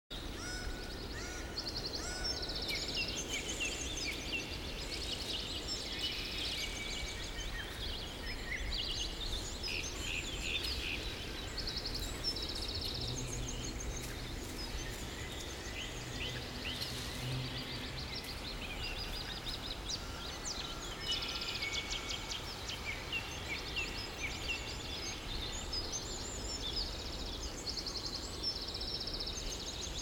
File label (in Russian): Пение птиц